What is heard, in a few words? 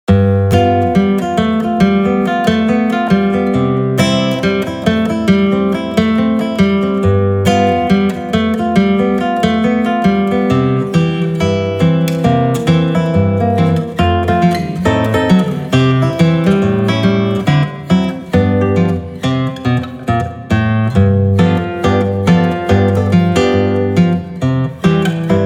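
Guitar; Saitenwege nach Nordamerika Band 1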